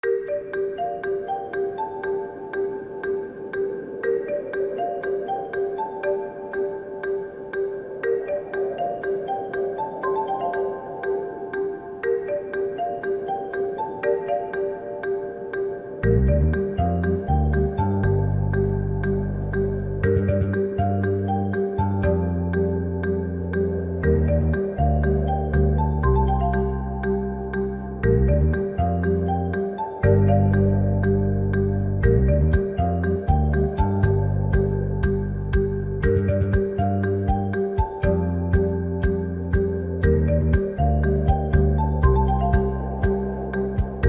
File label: Mines Marimba Instrumental Cover; Stardew Valley